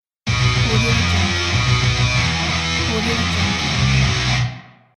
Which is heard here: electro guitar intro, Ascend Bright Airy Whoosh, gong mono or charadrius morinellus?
electro guitar intro